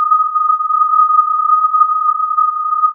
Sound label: filtered input